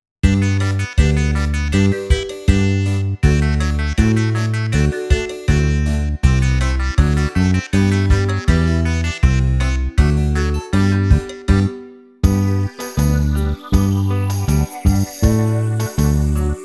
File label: Karaoke